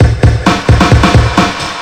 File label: drum loops; bb drum loops